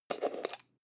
jar plastic midsize open slow

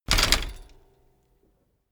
bicycle bike land rattle full

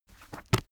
heavy book shut

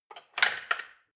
dishwasher cutlery grab

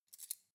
cutlery cake forks handle short soft

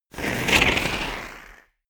volcano oneshot lava hiss

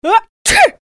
sneezing

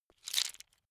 bone small handling left

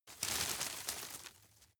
grab crunch medium

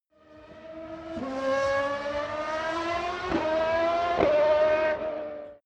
racing car f1 drive by shift up

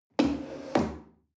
chair wood place slide medium

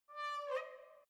letterbox metal pull open soft

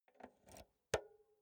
insert jack multi socket slow

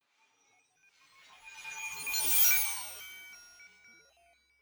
fly by drone digital long

